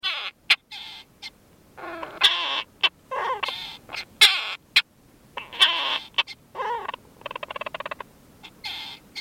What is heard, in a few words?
storm petrel